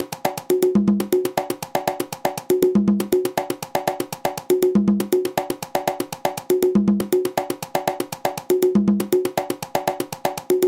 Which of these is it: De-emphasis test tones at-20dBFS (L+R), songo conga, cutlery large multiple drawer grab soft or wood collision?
songo conga